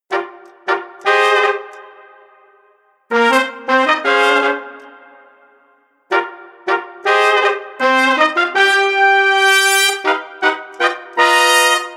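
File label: Horn Staccato Accent Velocity 80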